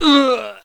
human die; wesnoth

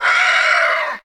lich hit; wesnoth

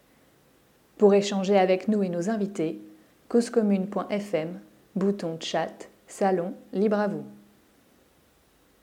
jingle

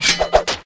throwing knife; wesnoth

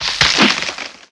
wose miss; wesnoth

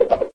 magic thorns miss; wesnoth

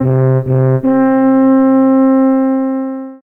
horn, horn signals; wesnoth

